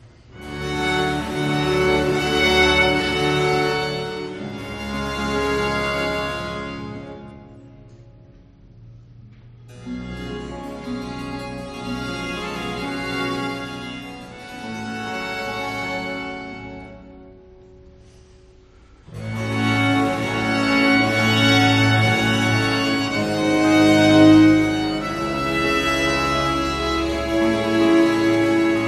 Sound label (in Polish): Koncert na czworo skrzypiec F-dur Adagio; Antonio Vivaldi